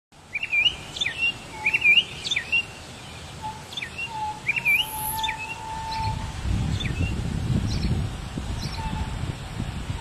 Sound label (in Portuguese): canto do tordo americano